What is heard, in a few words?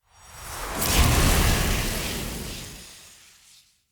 Fire Blade Idol Cast; Weapons; Enhancements; Elemental